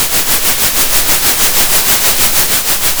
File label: center, Sound of a train